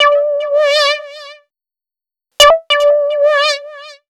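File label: SYNT 2, 100 bpm, Funk; USB Soundscan vol.41; AKAI; Roots Funk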